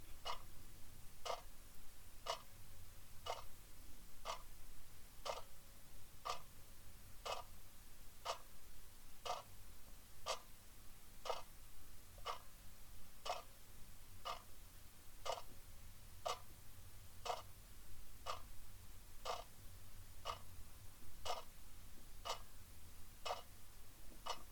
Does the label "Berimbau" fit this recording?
No